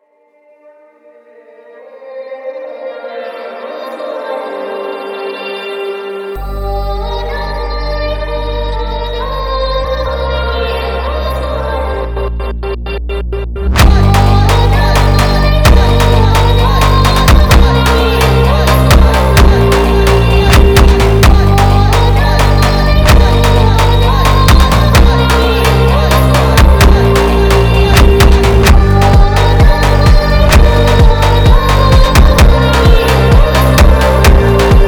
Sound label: Funk sped Up